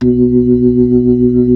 B-3, Club

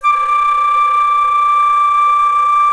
FLUTTER A5, WOODWINDS; Greytsounds VOL-1

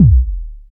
BD, Synth; Sound & Vision - Gigapack II CD 2

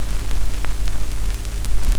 SCRCH NOZ, Record Noise; AKAI S-Series CD-ROM Sound Library VOL-4

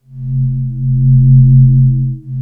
C3, SYNTH; SPECTRE; Q Up Arts; Dream Experience

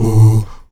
VOX AHOO, Afro Chants, Stacc Ah Oos; Roland LCDP11 Africa VOL-1

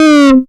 Dance Synths; Best Service ProSamples vol.37 - Dance Synths; Wasps

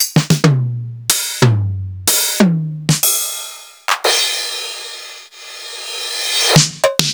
Roland Tr-707; Sliced

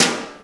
Ugly Acoustic, Snares; Drums

